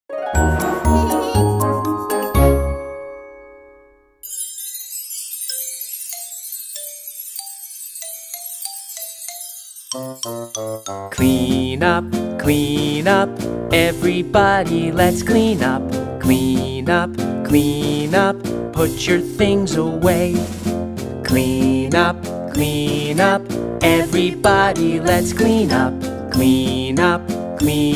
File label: Kids Song for Tidying Up